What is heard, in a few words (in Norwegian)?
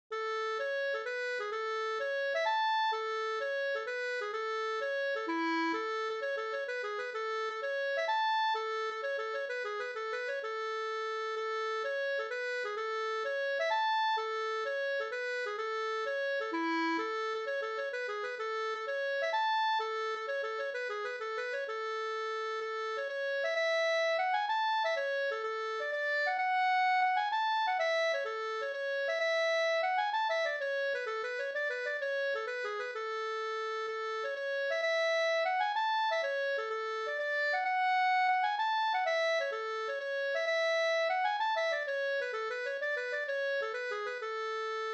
pols; Norskleitjen no